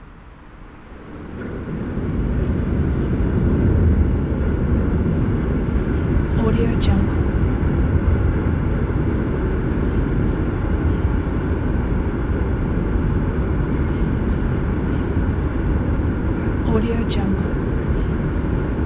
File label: under train bridge ambience